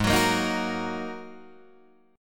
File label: G sharp 7th Suspended 2nd G sharp Guitar DADGAD